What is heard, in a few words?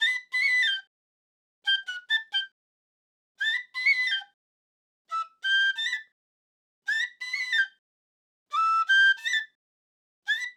Hip Hop Flute G maj 140 BPM